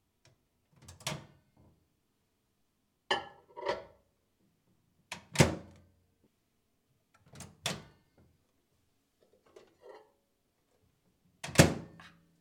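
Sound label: microwave door, LG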